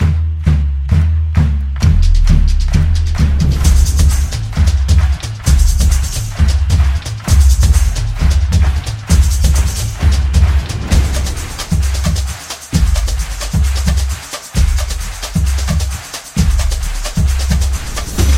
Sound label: Sport Samba